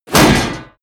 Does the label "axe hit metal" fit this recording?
Yes